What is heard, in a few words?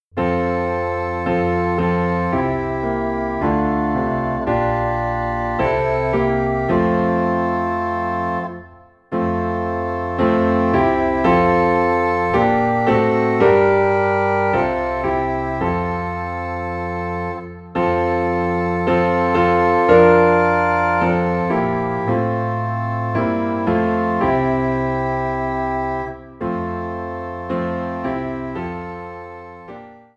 Piano and Organ in F; When I Survey the Wondrous Cross